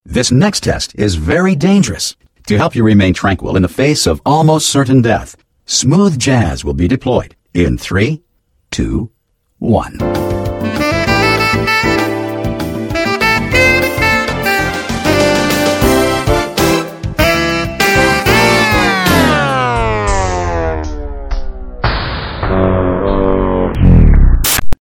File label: smooth jazz